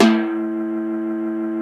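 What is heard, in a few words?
Timpani Drum A1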